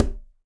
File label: Conga C Heel; Latin Percussion; Drums; Drum Hits; Ableton; Factory Packs